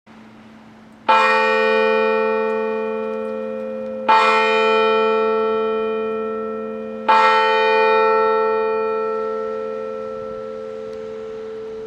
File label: angelus bells